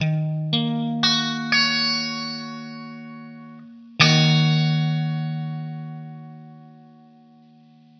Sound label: cm7 spread voicing